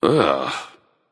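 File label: nonverbal ugh; smite; voicepack; merlin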